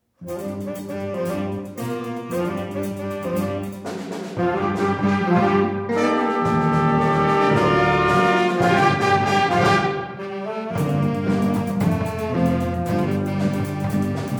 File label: high school jazz band